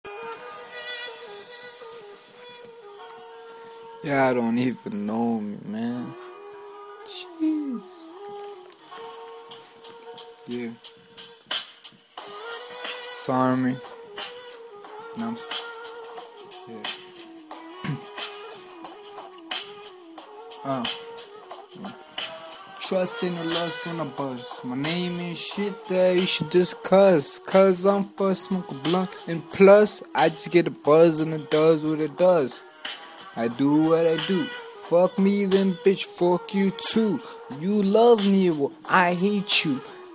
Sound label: army spitting on iphone